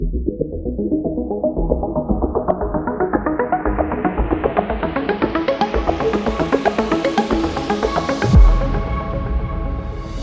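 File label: A Tech, Modern technology background music; hi tech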